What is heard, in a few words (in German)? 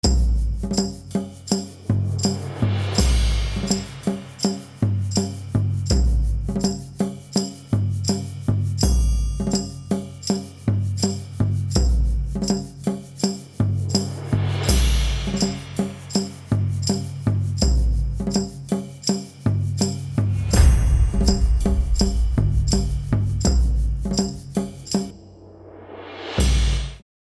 schlagzeuger percussion; studio hamburg deichtv; theatermusiker filmmusik groovedesign